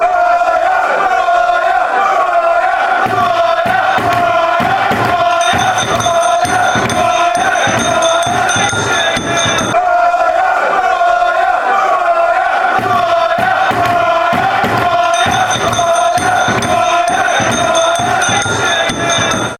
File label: who are ya with bells, chants